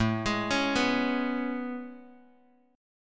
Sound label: A Major 9th A, Bass Standard